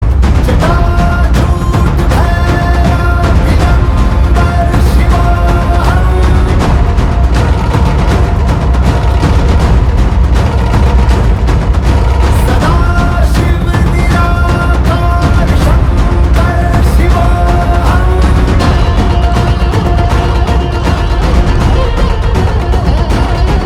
Hindi; Adipurush

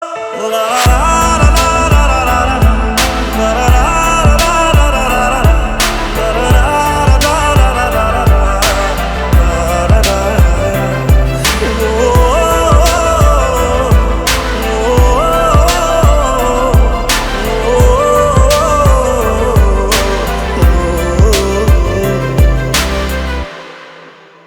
vocals instrumental

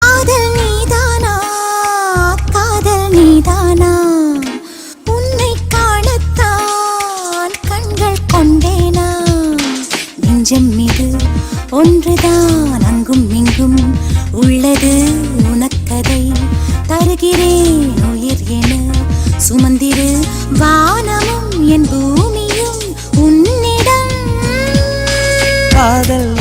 female version

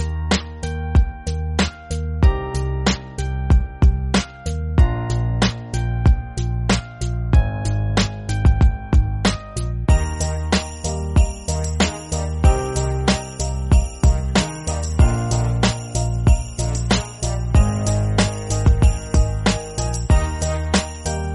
Christmas Hip Hop

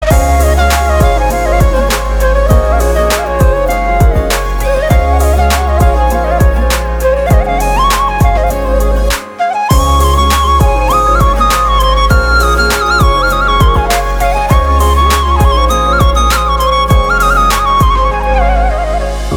Instrumental BGM Ringtone

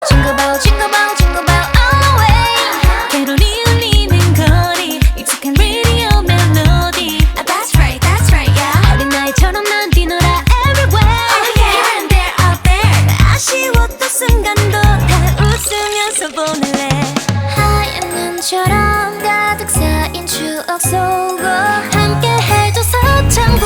Beautiful Christmas ringtone

